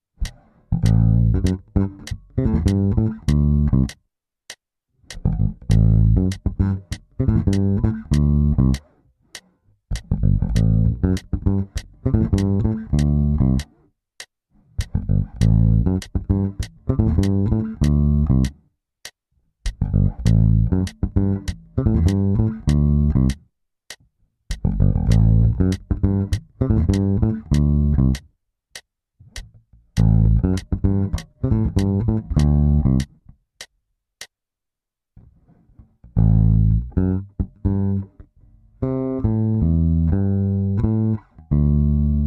Bass line in A with triplet raking

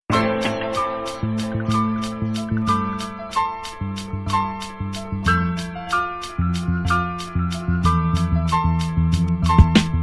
beat

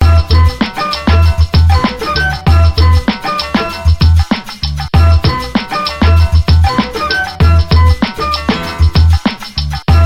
beat

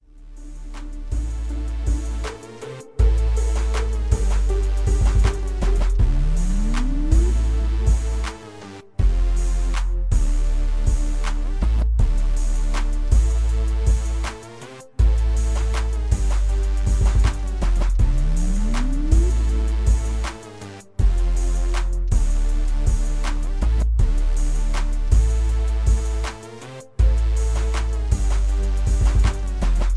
Super Clean 80BPM

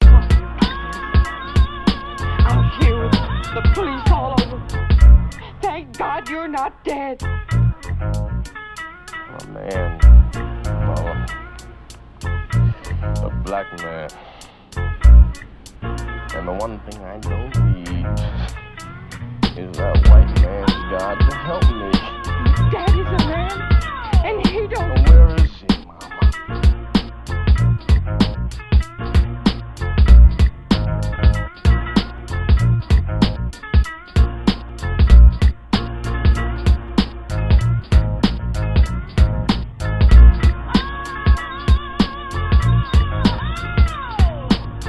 beat

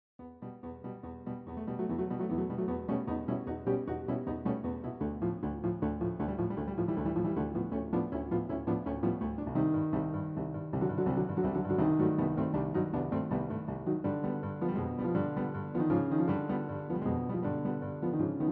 Carmen Variations piano roll; composed and played by Horowitz

